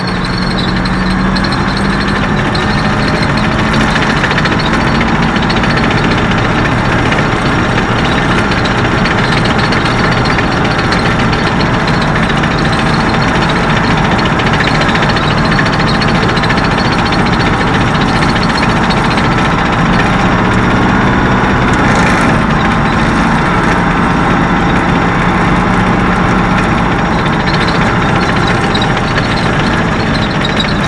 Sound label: tank drive